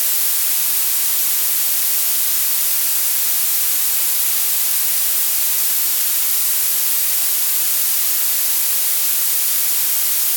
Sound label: violet